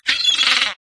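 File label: rural seagull; random amb sfx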